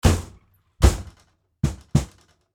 Emergency Ambulance Doors Close Knock Double Interior Rear; Emergency Vehicle; Emergency Vehicles